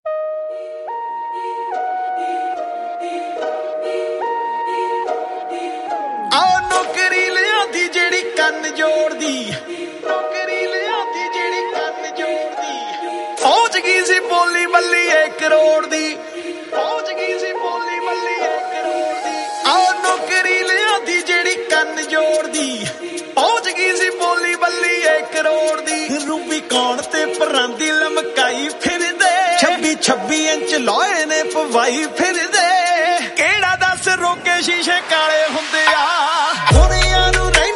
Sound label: Music